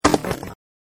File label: Mining Fall; Sunflower Land